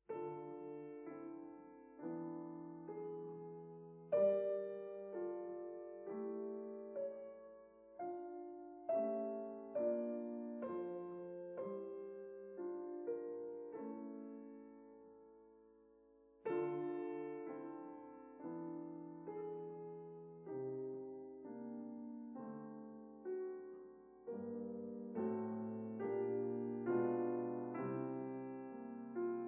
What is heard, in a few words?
Variations